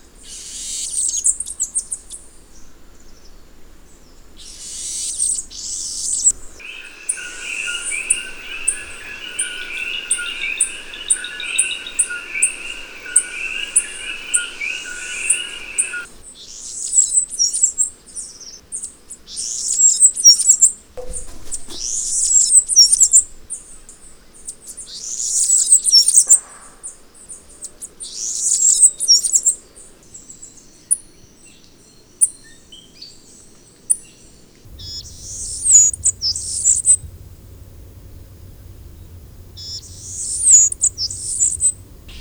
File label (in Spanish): reinita comun; Los Colores que Cantan